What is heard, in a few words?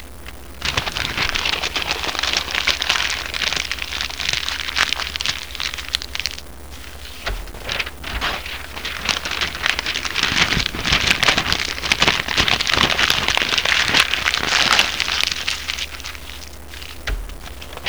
CRUMPLE